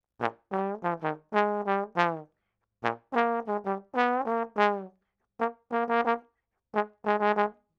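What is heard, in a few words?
092 Latin Jazz Trombone Eb major; Brass; Pro Tools Essential Loops; Digidesign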